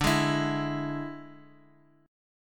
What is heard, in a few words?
D Suspended 2nd Flat 5th D, Guitar; x,x,x,0,0,3; strummed